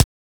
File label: Weapon Punch SMG